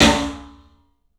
club snare, 3D Drums; 3D Sound

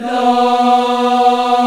VOX, African Aahs, African Las

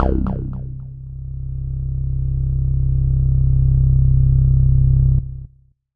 C1, SynthLine; 90 s Sample CDs; NiceBeats; SynthLine VOL-1

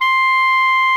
CLARINET C6, REEDS; Greytsounds VOL-1

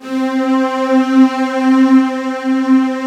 ANLG STR, ANALOG STR

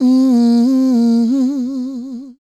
A MMM, Vocal Blues Soul; Spectrasonics; Planet

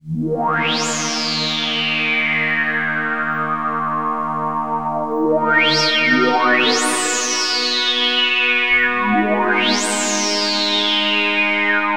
pad, Drum & Bass; Best Service ProSamples vol.03 - Drum & Bass 1; AKAI

